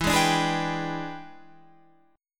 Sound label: E 7th Sharp 9th, Guitar, Open F; E7#9; strummed